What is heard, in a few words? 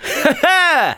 Emote Cheer; Twitch Skijump